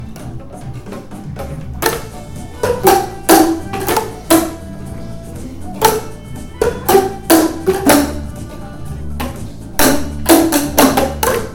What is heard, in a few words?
Boomwhackers